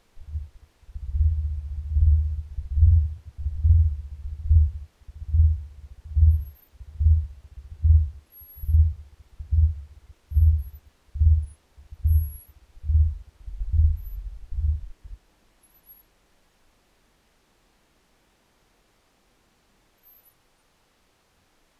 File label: Blue Whale A And B Calls; Audible Only With Appropriate Speakers